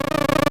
Bee; Zelda; LTTP

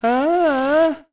Haahh; Kamaro: "Ha-ahh!" (used for his "translations")

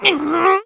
Lift Struggle; Link struggling to lift an object; zelda

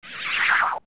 Shrink; Poe; zelda; OOT

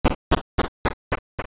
Stairs Up; Walking up and down stairs

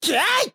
Attack

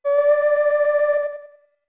Notes Voice D long; D2; OOT

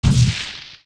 Fall Down Tall Grass; Link

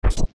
steps carpet land; zelda; OOT

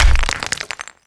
Rock Shatter

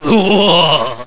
King "Huhahaha"; King Daltus: "Huhahaha..."

